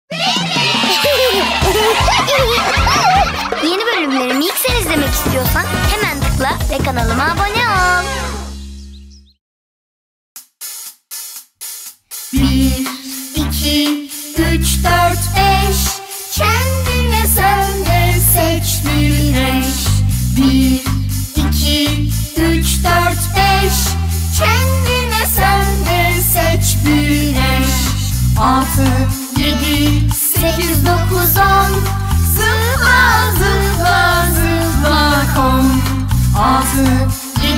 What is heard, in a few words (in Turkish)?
sayilar sarkisi cocuk sarkisi; pepee